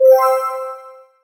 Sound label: mine safe